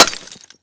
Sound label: glass; Escape from Tarkov